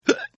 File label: Hiccup; Human; Hiccup 2; Hiccuping; Hiccupping